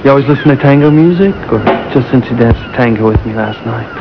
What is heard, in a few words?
tango